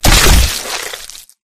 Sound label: bodyfall combat form; flood